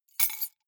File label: key ring large drop stone soft